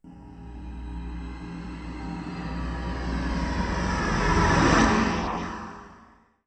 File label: sfx sci fi; Masterbits - Switch FX